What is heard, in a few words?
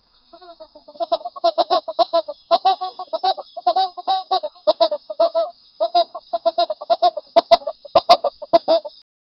i need fast pace chicken